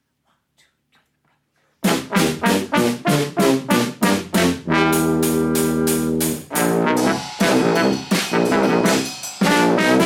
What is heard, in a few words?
Jingle